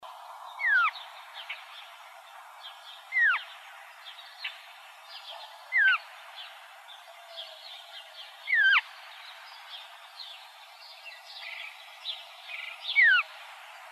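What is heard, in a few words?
Bendire's Thrasher calls, Mimids; BETH; deviche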